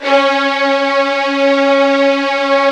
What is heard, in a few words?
C#3 violin; VIOLIN1